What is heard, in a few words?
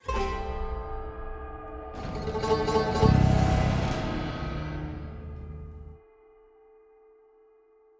Action Surrounded 120bpm Intro; project zomboid